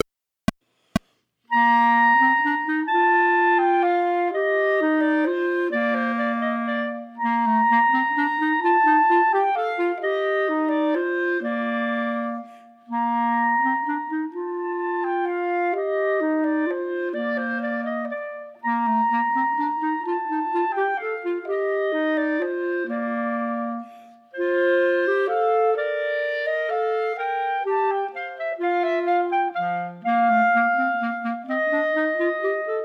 Minuet, Chamber Music for 3 Clarinets, minus 2nd part; Partita in F; Chamber Music for 3 Clarinets Vol I